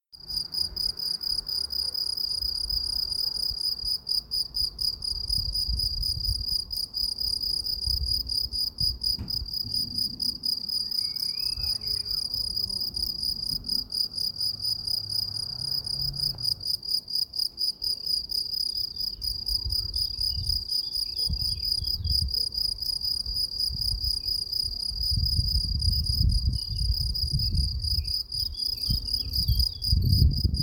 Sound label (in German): Feldgrillen